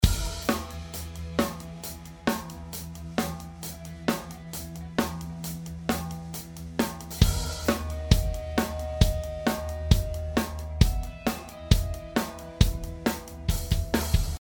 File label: Drums Snare Distressed